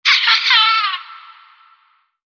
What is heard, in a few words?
evil laughter real